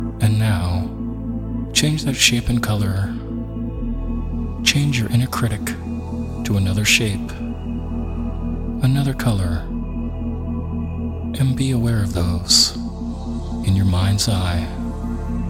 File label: Clearing Negativity Isochronic Tones